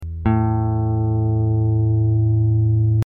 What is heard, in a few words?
guitar pluck